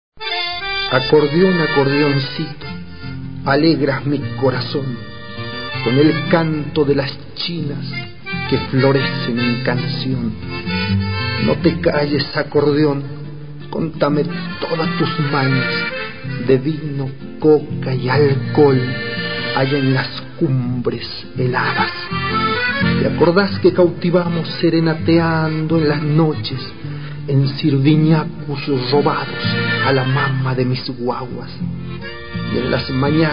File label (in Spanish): Mi acordeón